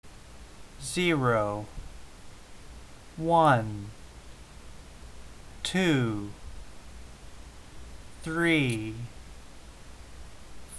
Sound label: slow